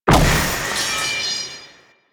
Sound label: Sceptre Drop; Royal Guard; Foley